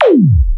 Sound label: Yamaha Motif ES-Series